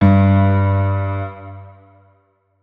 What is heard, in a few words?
notes, keyboard piano; Hideout Furniture